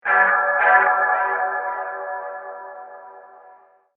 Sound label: Impact Metallic Horror SciFi Echoes, Impact Metallic Echoes Slow Motion Stutter Slow; Hits (Sound Effects:Horror)